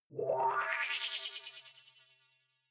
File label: sci-fi transmission incoming; swooshes-swishes